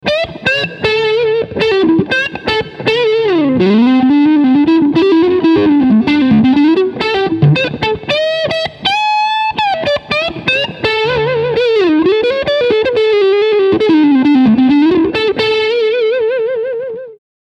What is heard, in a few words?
Overdrive Front HB Sc Mode